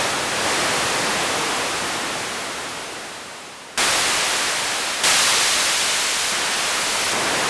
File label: wind